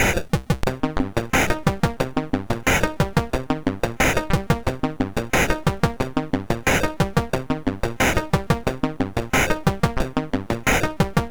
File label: Regae, MOS6581R2